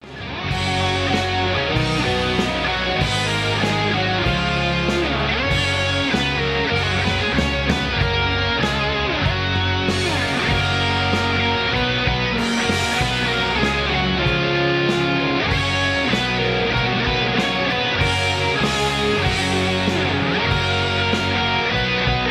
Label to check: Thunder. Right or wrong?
Wrong